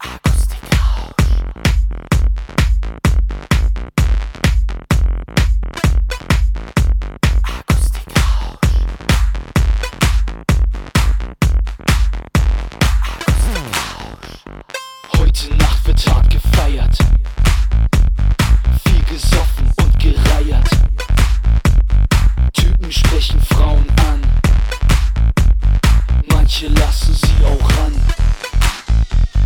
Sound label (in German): akustik rausch rocken